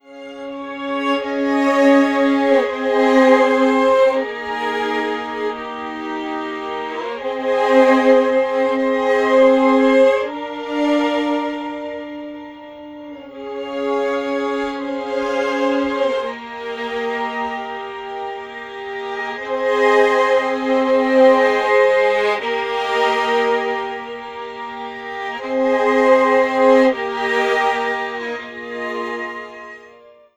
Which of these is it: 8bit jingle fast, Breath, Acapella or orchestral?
orchestral